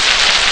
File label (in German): tennis klatschen; ST-XX Amiga Soundtracker Sample Packs